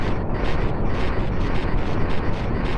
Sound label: lightning hum